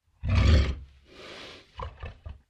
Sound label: dragon idle bonus wheel; koi princess